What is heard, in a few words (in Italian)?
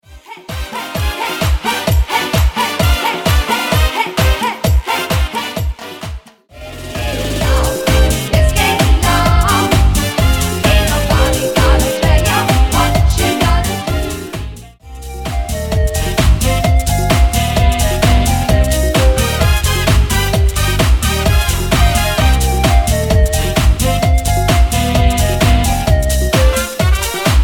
Cha Cha Cha Ballo Di Gruppo Canto